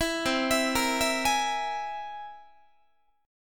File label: C Augmented 7th, Guitar; arpeggiated; JGuitar